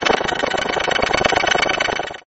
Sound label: Noisy tone chiptone; gridtracker